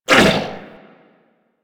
Struggle; Warframe; Amalgam Override; Abilities

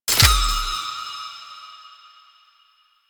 Sentient Shard Pickup; UI; Pickups; Warframe

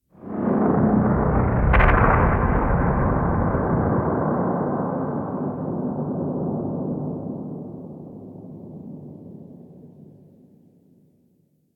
Gas City Ext Distant Seismic Explosion; Ambience; Warframe; Corpus Gas City

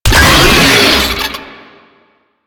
Infested Player Spotted; Enemies; Vocals; Warframe; Proto Infested; Brachiolyst

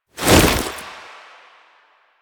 Gap Close Jump; Lasrian Butcher; Warframe; Lasrian Soldiers; Foley; Stance

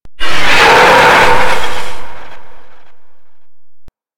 Golem Grenade Head Attack; Warframe

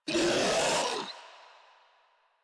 Infested Ancient Player Spotted

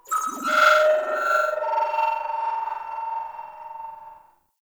Reinforce Beacon Call; Warframe; Venus; Reinforcement Beacon

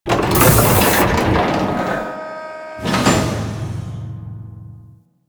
Mover Hands; Con Junction; Warframe; Ambience; Entrati

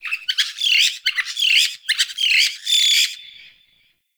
Bird Alerted Caique Call; Midrath Island; Wildlife

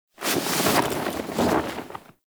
Camp Canopy Flap; Quests; Veilbreaker; Kahl Camp

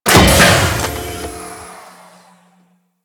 Shot 3D; Grn Ogris

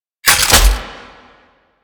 Shotgun Mech; Warframe; Veilbreaker; Kahl

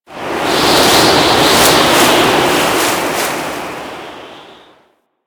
Ocean Rain Gust; Ambience; Warframe; Grineer Ocean

